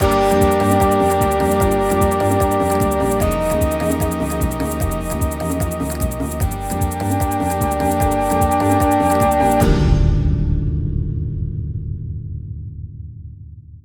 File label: boss stinger